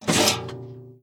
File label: sfx shield; Animation Throwdown